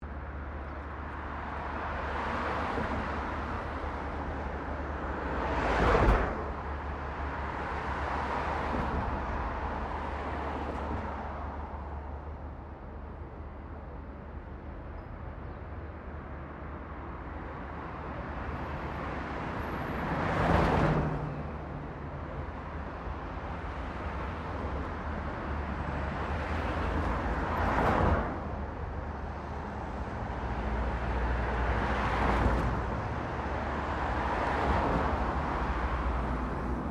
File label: Traffic Cars General Pass By; General traffic sounds; Traffic and Cars